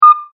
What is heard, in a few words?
multimedia rollover, Multimedia Rollover Tone